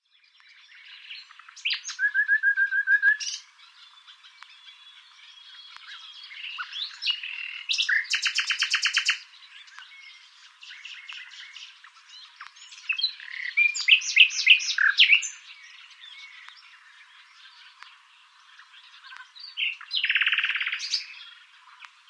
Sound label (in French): rossignol philomele, Rossignol philomèle; chants d'oiseaux; ile cremieu; enregistrés par Jacques Prévost de la LPO